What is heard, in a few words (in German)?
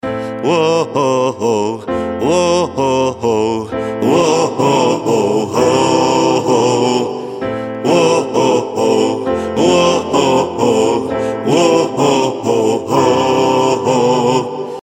chor aus einer stimme; Verdopple die aktuelle Stimme